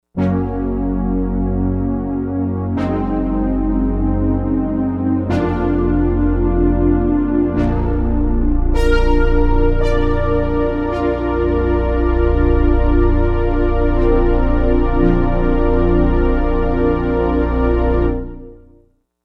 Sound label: Brass Pad; Digital Synthesizer